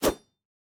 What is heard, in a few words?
Melee; boomerang fu